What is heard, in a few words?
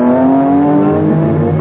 Stretch Zoing!